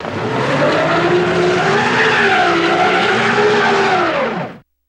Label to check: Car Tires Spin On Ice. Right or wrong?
Right